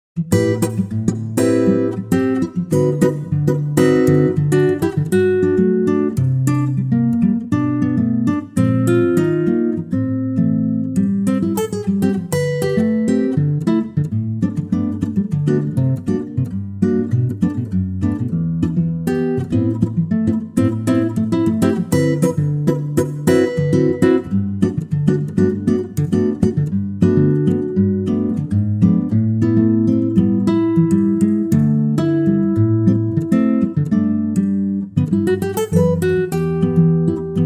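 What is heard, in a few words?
G acoustic guitar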